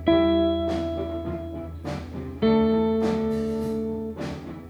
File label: guitar